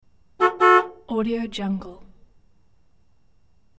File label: horn honks impatient truck; hollywoodedge